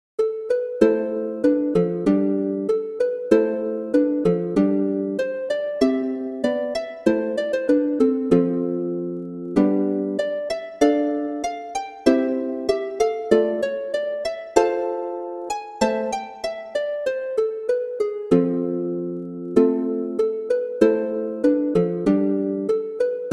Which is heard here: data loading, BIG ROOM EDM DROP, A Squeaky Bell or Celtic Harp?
Celtic Harp